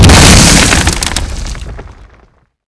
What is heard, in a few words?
mortar hit; firearms